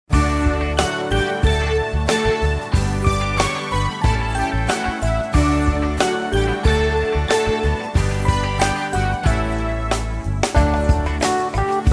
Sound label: Karaoke